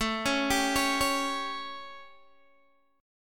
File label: A 7th Guitar Open D, chord; 19,16,17,19,16,x